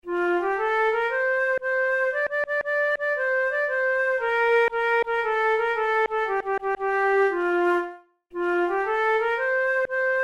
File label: march, flute tunes